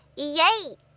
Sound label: Hooray Yeah